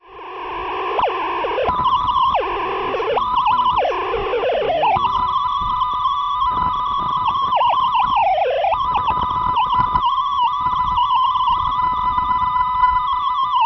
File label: laser telescope rx; Laser with telescope; Stereo audio file recorded at Inspiration Point; The LEFT channel contains local audio; insipiration